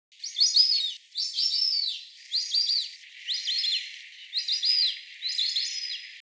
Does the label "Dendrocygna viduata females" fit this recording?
Yes